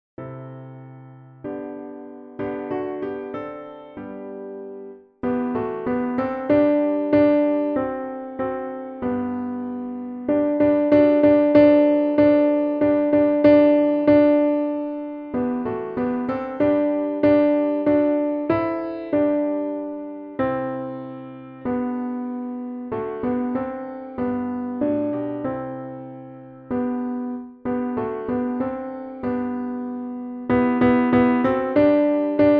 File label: piano playing the tenor part